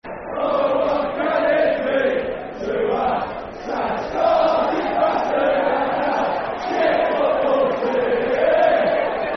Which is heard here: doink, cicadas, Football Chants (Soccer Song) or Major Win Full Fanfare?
Football Chants (Soccer Song)